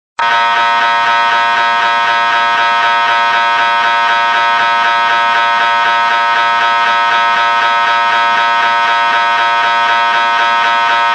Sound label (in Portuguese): alerta de evacuar